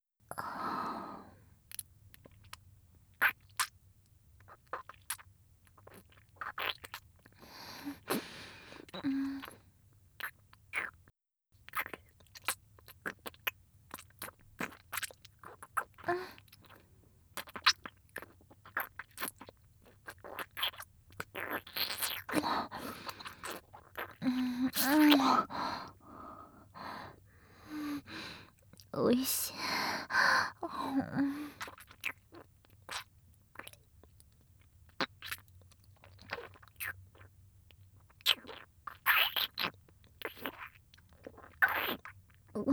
キスC(舌フェラ), ASMR; 清楚ビッチエルフ姫のメガ盛りマントロフルコース

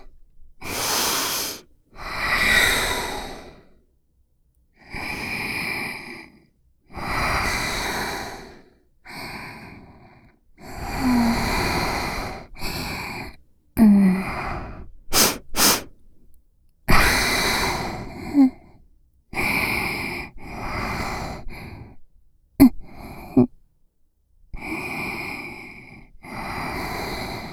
チン嗅ぎと裏筋舐め上げ 中 チン嗅ぎ; ギャルフェラッ!!学校は援交ギャル風俗店ッ!!

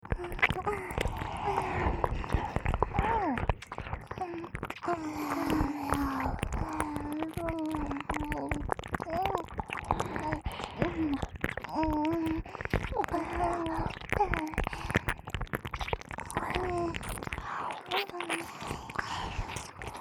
両耳舐め+ディープキス; 小春; KU100; ハーレムASMR

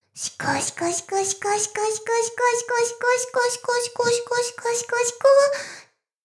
しこしこ; 全妹計画２; メスガキ妹

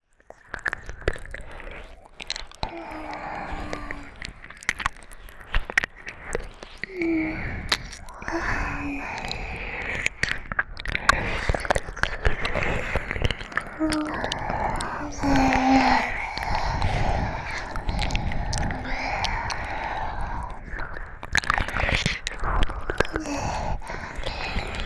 舌先 うねうね 耳舐め; 両耳同時; 【耳舐め超絶特化】吐息たっぷり…とろとろねっとり快感耳舐め。～おみみ、いっぱい気持ちよくなっちゃおうね…♪～

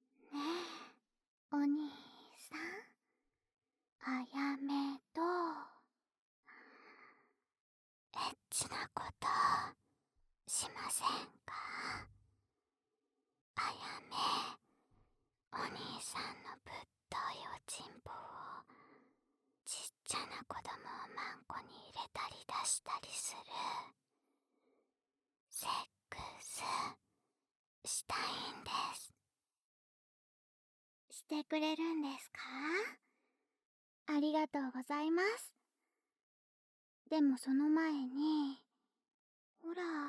耳舐め; 勃起誘惑

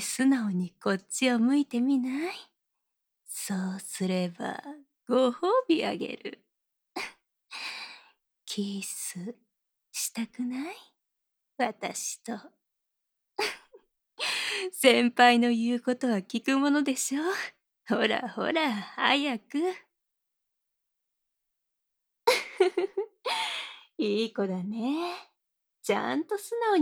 命令されながらディープキスしている音声

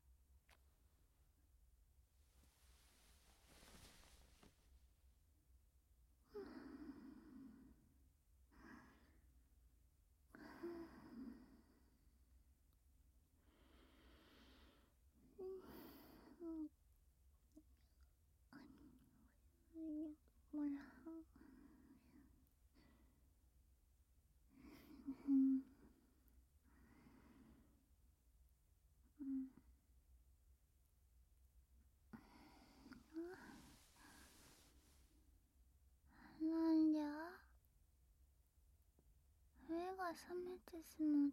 耳舐め, ASMR; 邪神のじゃロリアメリー様の添い寝耳舐めフェラチオ甘やかしよちよちねんね

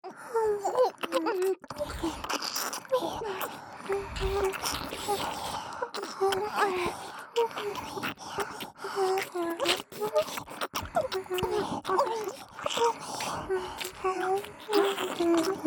両耳キス舐め; ラブマチオ; オナサポおまけトラック

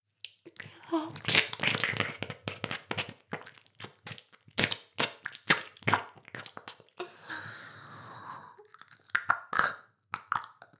耳舐め; 全妹計画２; 【メスガキ妹】わからせてくれるのよねぇ～?おにぃ～・ちゃん♪【全妹計画<オール・シスターズ> 2妹め】

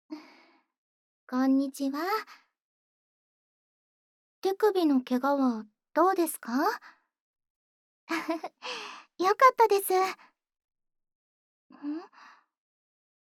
耳かき, ASMR; 癒し効果のある耳かきを体験してみてください

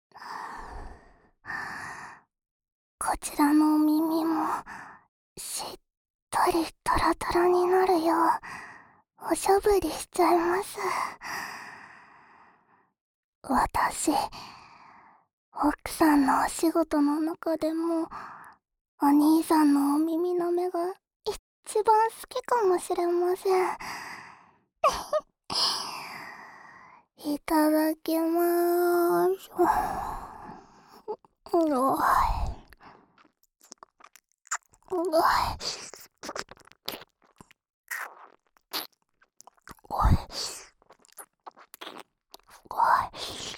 愛情いっぱい耳舐め（左耳）; 究極耳舐め; 幼妻姉妹と送るいちゃらぶ新婚耳舐めASMR

